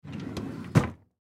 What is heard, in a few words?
Workbench Drawer Close; Factories; INDUSTRY; Blastwave